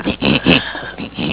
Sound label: jump; openffa